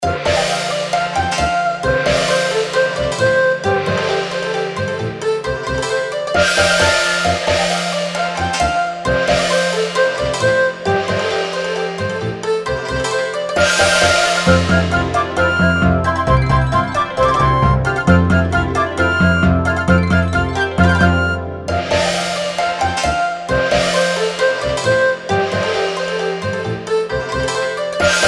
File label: light steps